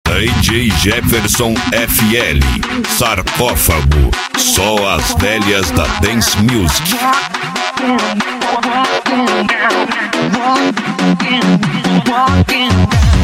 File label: dance musik